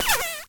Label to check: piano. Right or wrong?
Wrong